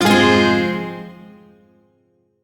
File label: Shawzin Phrygian Chord; Music; Warframe; Duviri; Chords